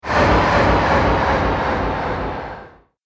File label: Sturdy Sweep Low Delay, whoosh